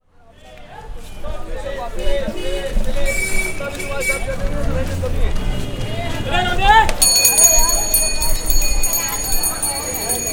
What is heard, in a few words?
BOMBAY, AMBIENCE, Indian Traditions; USB Soundscan vol.45; AKAI